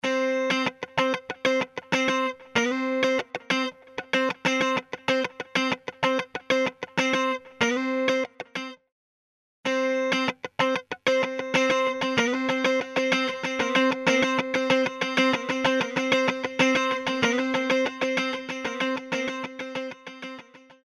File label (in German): Internes Delay; Supertap VG